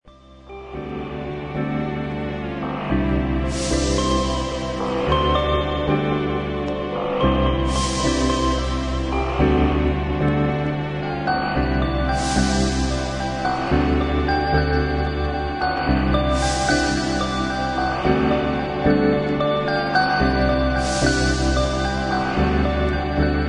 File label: AMBIENT EXPERIMENTAL